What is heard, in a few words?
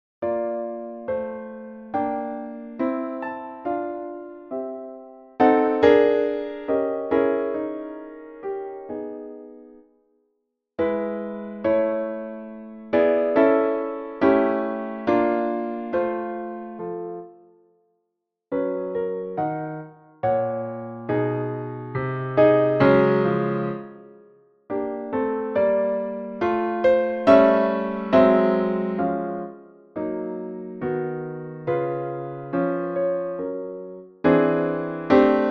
Recitative in Reger-style (piano)